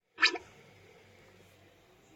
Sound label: popcork